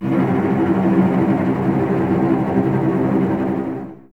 C 2, String Ensembles Cellos Tremolo; Miroslav Vitous

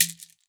CABASA; Xsample VOL-9

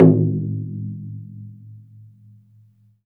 DA PAI GU, CHINESE PERC; Spectrasonics; Heart of Asia

